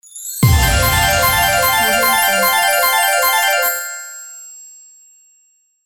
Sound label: jackpot sfx; eskoma